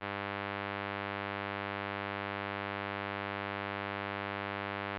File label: pulse train